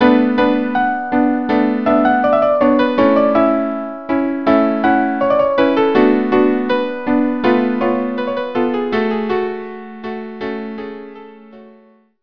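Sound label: string quartet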